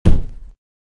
thud

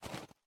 Walking; Folk Hero

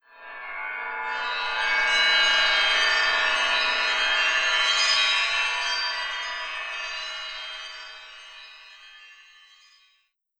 Intro Amb China

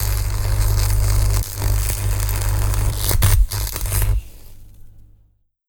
Electricity, Long

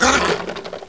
Skeleton Dead

